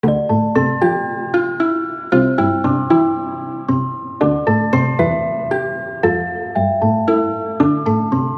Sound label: Short Gothic